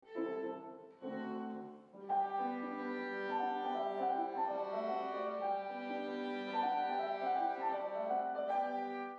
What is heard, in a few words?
Bagpipe